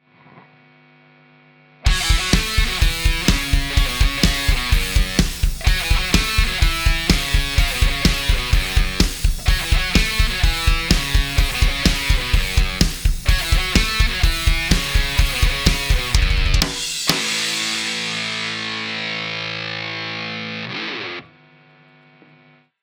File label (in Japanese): ストラト